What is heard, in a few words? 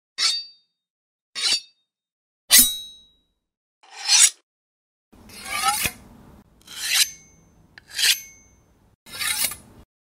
Sharpening blade